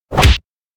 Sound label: punch hit; anemoi